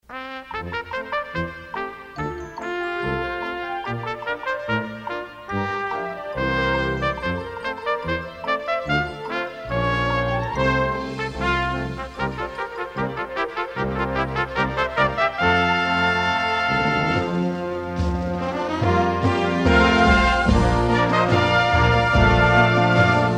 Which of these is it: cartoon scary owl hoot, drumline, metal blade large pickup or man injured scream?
drumline